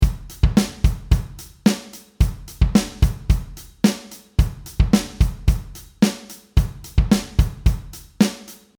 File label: funk 110bpm